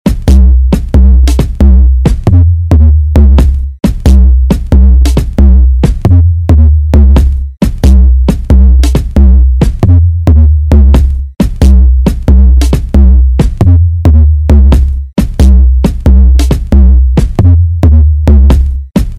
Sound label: bass beat